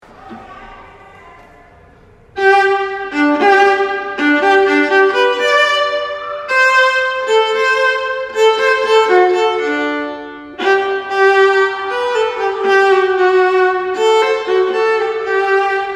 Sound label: Eine Kleine Theme Viola